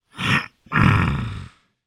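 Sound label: fantasy giant male clear throat; zapsplat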